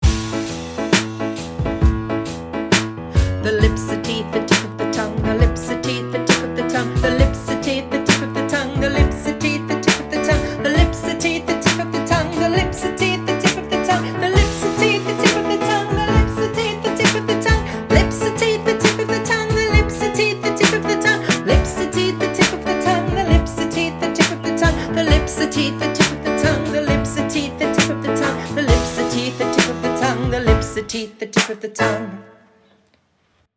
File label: The Lips the Teeth Backing